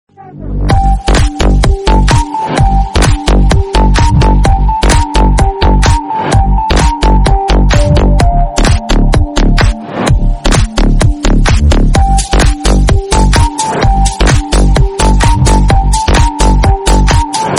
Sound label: remix song